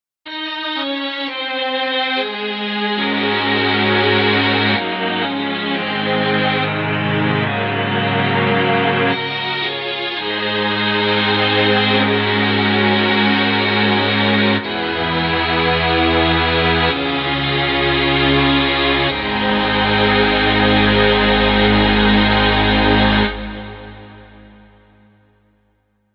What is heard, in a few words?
Strings